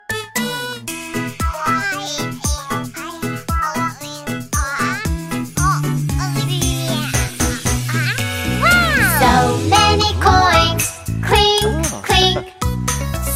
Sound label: song cartoon ringtone